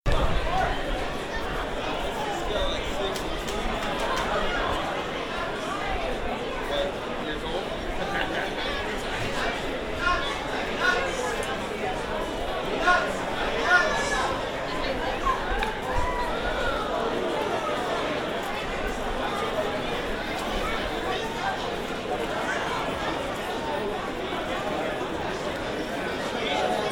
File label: Crowd Noise